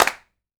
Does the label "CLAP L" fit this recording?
Yes